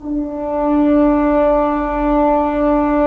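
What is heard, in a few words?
Solo French Horn, Brass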